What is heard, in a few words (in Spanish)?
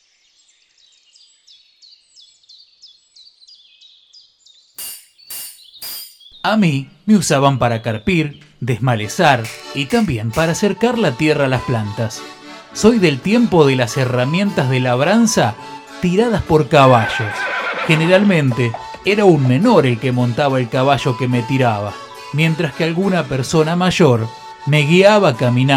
ROTURADOR CARPIDOR